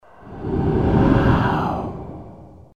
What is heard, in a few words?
ghost breath; Страшные звуки для монтажа